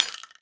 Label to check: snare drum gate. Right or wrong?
Wrong